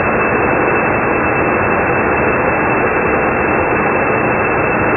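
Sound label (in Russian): турбина одиночный свистящий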